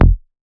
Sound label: Raw Bass F#